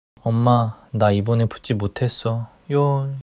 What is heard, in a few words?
crying